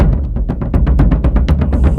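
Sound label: Music Shield; from dust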